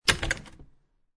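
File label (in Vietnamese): Tiếng đóng cửa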